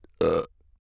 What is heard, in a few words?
burp; OPENAIR; Anechoic